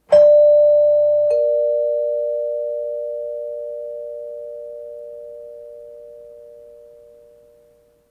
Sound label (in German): tuerklingel, geraeusche